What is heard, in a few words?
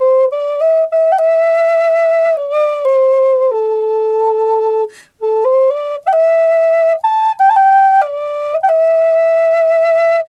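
flute, 93 BPM; Big Fish Audio - Didgeridoo and Other Primitive Instruments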